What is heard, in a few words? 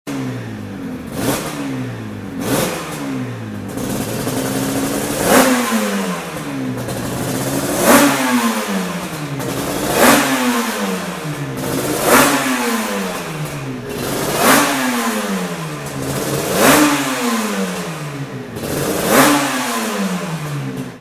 Racing Sound